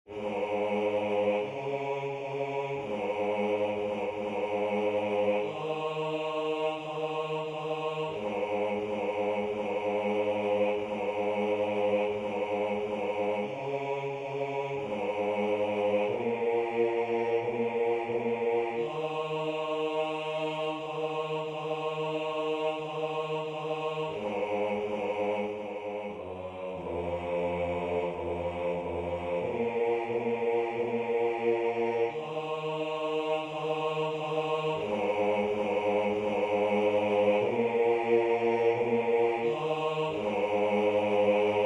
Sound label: Alma Mater bass; TTBB; Rehearsal Recording; West Point Alumni Glee Club; Tenor I Tenor II Baritone Bass